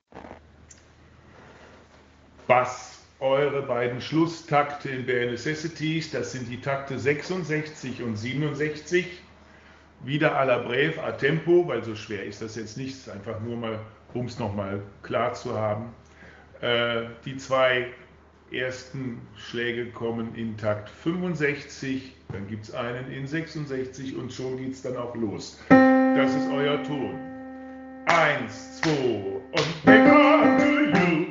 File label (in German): BN Bass atempo